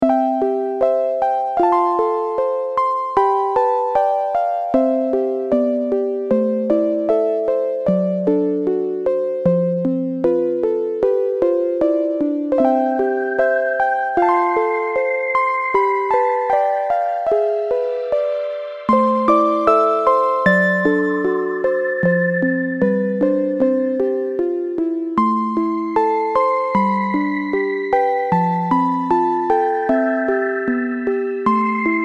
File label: erhu lullaby